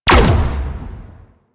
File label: Capital Ship Turret Fire; Warframe; Vehicles; RailJack Enemy Ships; Corpus